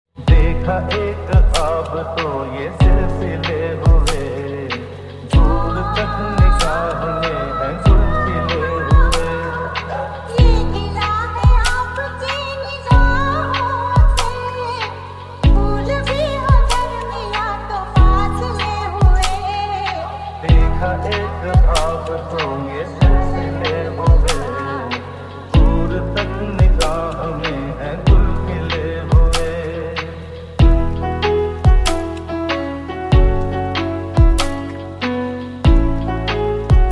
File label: lofi ringtone, hindi ringtone